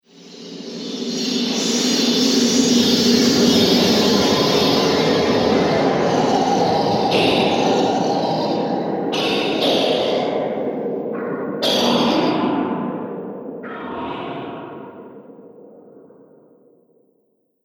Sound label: Fantasy Monster In The Mirror; Monster Sound Effects; Andrew Fleming